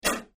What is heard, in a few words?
Air Mortar Blast From Close Point of View, At 45 Pounds Of Pressure, X3